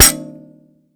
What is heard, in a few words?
Finger, Prepared Kit; Battery 3 Sample Kits